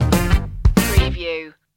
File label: funky jazzy stinger